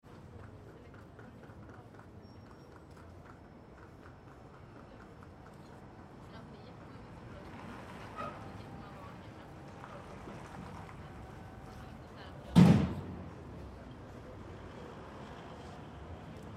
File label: Light traffic with occasional people passing, faint Swedish; Some heavy trucks pass by distant. Calm mid day; faint Swedish voices; Traffic Spring Day People; Traffic And Car Sound Effects